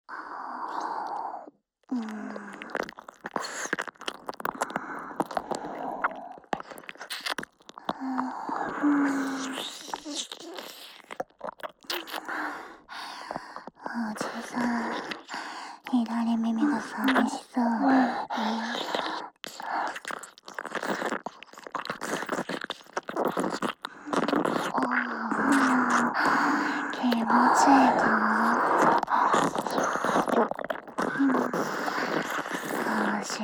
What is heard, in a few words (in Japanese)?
左右 から の 耳舐め, ASMR